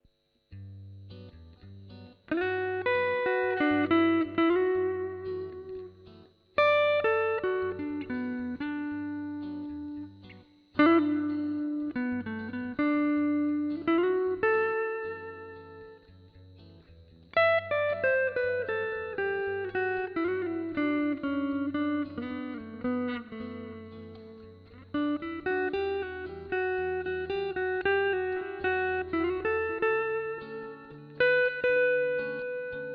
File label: Zoom G5 dual